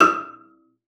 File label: CELLO FINGER; 90 s Sample CDs; Xsample VOL-5